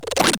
machine

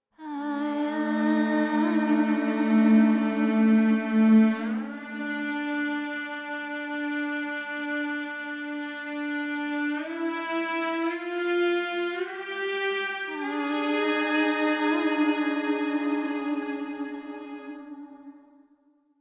Monster Hunting; The Witcher 3 Wild Hunt; Skellige

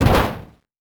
Metal Impact

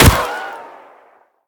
Gunshot Miss; The Town

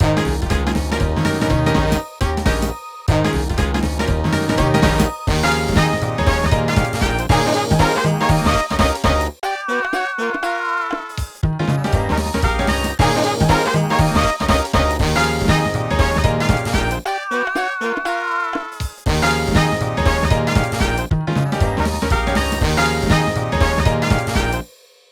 Jingles; Potluck Gang; WarioWare Gold

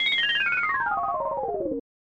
Fly Down; Bubsy II; SNES

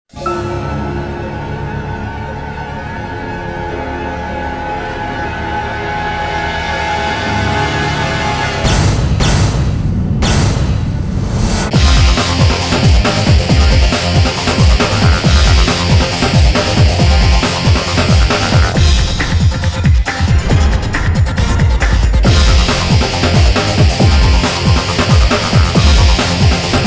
Techno Rock Trailer; Crime Cities

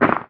Ground short; devour

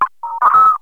cutise

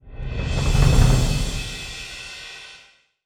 Tactics Victory; This Is the Police 2

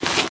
foley run; archer; Aragami

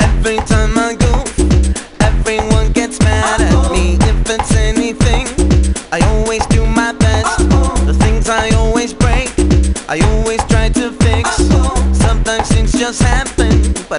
Uh Oh; gamerip; Happy Feet Two; nds

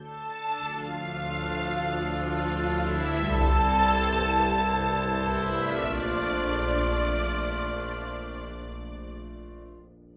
Orchestra Layer

